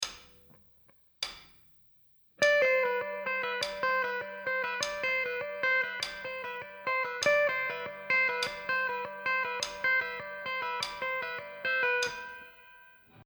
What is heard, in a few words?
gitar